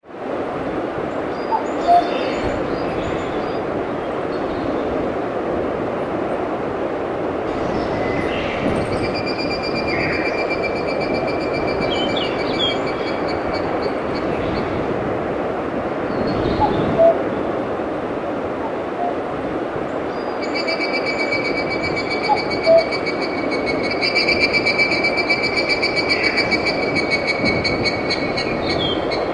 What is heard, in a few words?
Ambient Cricketsong; Orchestrion